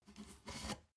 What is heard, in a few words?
step ladder wood scrape soft single; schritte